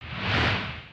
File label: mission ui imagination; missions